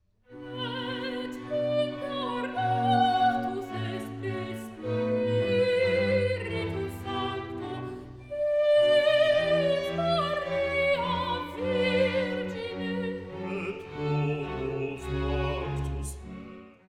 Credo Et Incarnatus

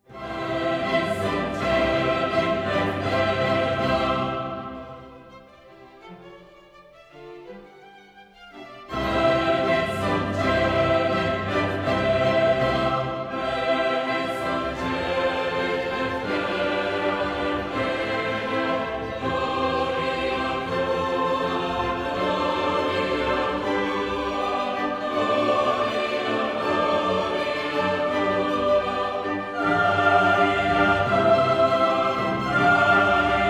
Sanctus Third loud

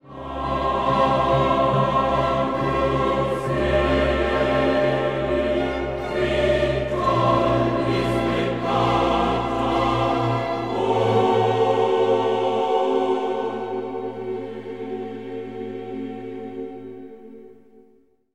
Agnus Dei Chorus

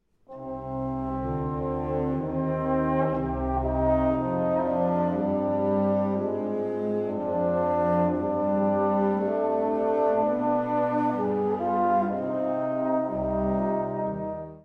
Agnus Dei Trombones

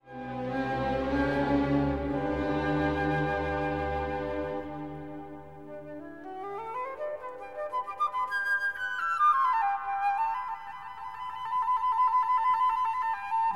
Benedictus Flute Cadenza Hosanna

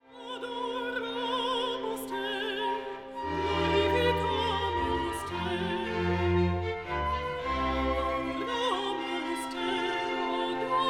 Laudamus te Solos closer

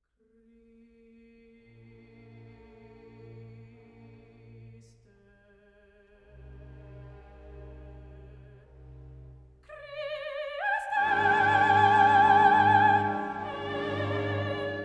Christe; K427

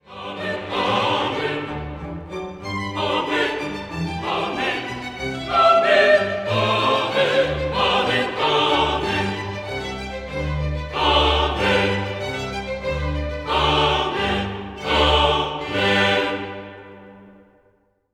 Amen

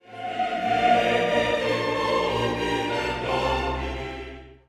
Benedictus qui venit in nomine

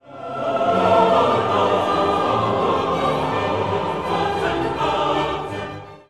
Dona nobis pacem chorus

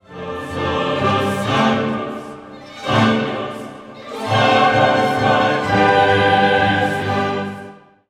Gloria Quoniam

